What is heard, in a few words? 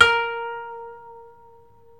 KOTO MILD